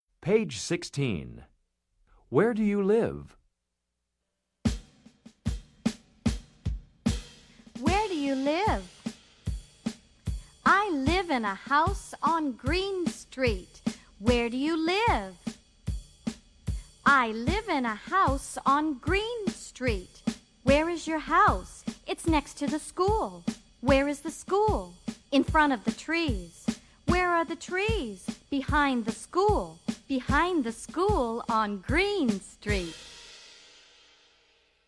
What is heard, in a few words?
jazz chant where do you live